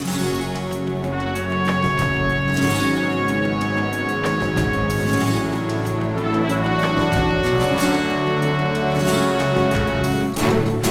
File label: Combat